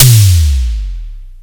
Long Decay, Simmons Drums; SDSV Tom 3

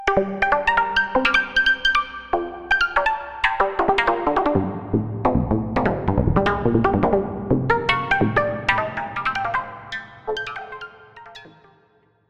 Plucky Patch

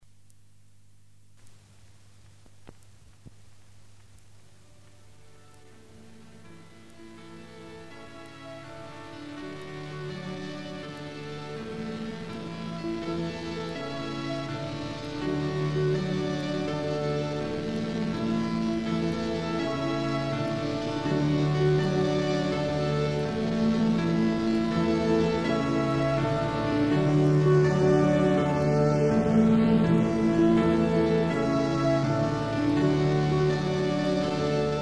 synth; cgs synth; Ken Stone Recordings; ricks synth

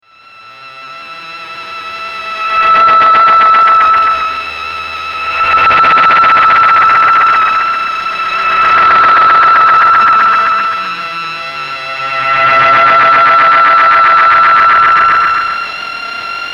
Wogglebug echoes; Wiard; wiardgroup

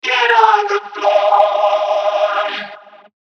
vocoder

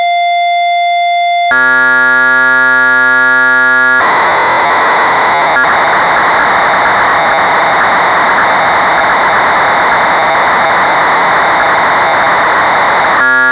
Audio Cassette of Original Patches, Poly-800; Original Poly-800 MKI patches and sequencer data; Korg

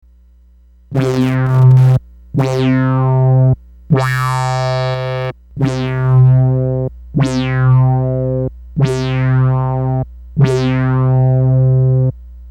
funny cs80 voice, yamaha cs80

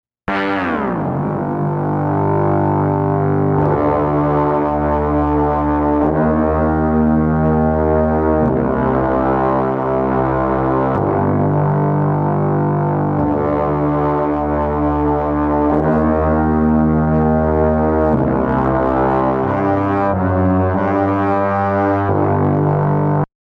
MK VI mkII Half Speed Brass, Mellotron

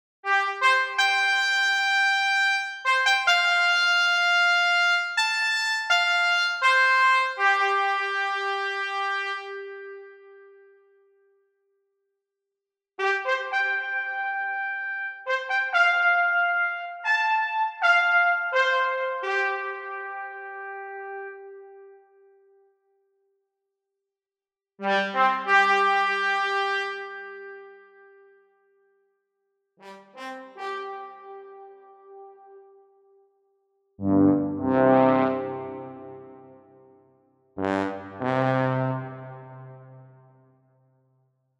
Imitative Horn

